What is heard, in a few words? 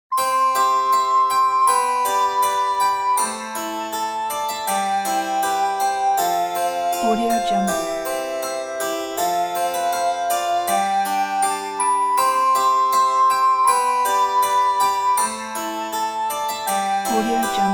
medieval storyteller